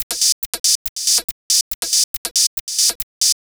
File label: ACID TECHNO